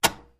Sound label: Switches Industrial